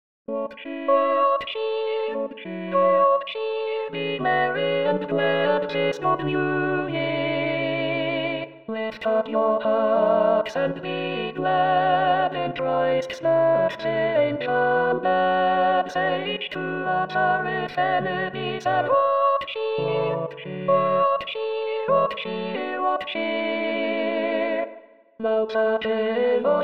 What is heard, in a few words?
alto; Walton